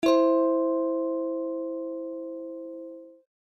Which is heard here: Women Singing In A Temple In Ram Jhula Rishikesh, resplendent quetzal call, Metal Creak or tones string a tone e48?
tones string a tone e48